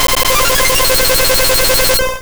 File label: Hyper Beam